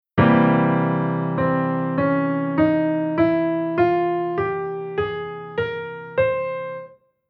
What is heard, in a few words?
Chord Poly; Jissen